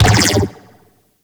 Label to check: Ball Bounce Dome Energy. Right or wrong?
Right